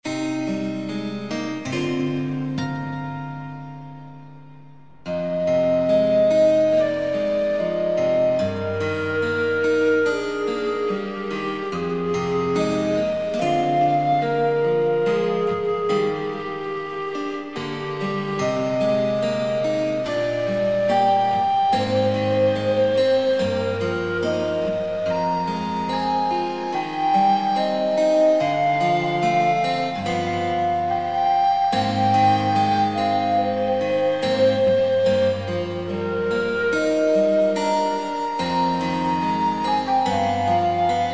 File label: Peaceful Guitar